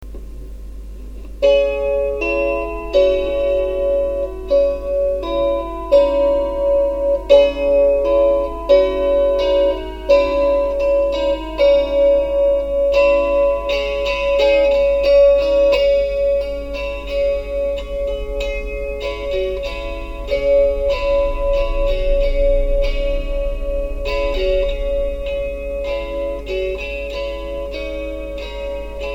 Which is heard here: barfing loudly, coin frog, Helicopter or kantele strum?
kantele strum